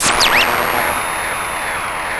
noise

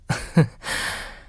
giggle; kzibuki

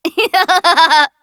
laugh; monokuma kid

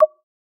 sfx blip narrator

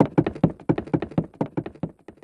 run

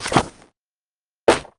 book open flick; susato

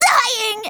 dying

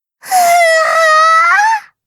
happy noise

